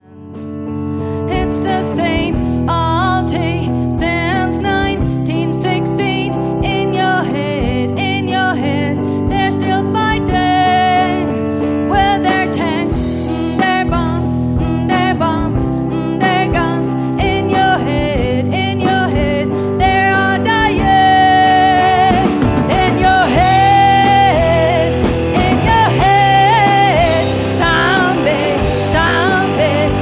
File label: zombie